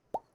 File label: popping